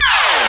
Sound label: Electro FX; Ueb HE Electro FX